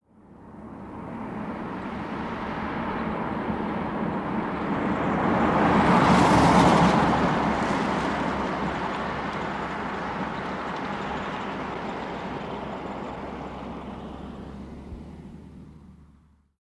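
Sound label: download Sound, Chevrolet Corvair, By Medium Close Up, Medium Speed.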